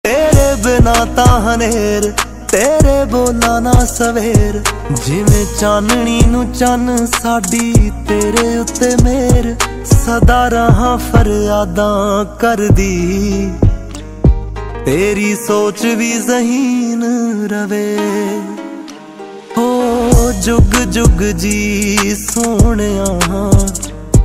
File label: Ringtone